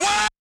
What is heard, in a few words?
Vocal Shout "Wha"; One Shots; Ableton Live 12 Lite; Core Library